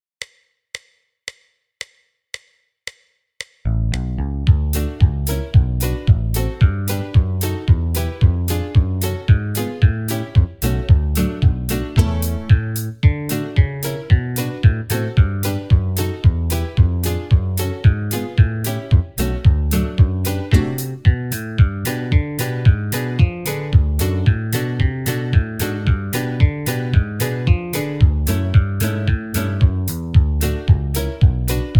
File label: key of a faster, banjo backing tracks